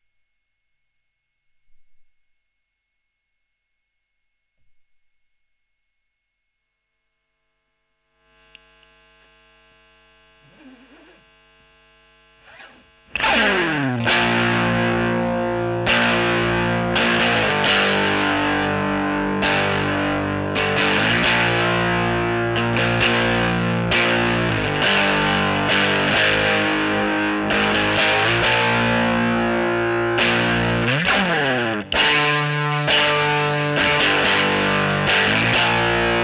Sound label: E.GTR Gefell OD